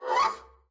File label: arco noise zipperlike, double bass; dsmolken; noises